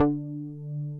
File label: JX JACK D4, JACK BASS; Drums In Your FACE!